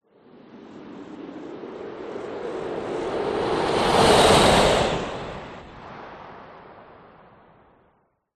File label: fantasy magic wind whoosh spell; zapsplat